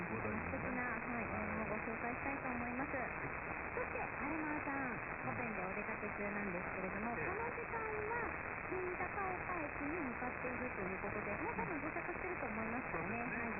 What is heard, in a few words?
radio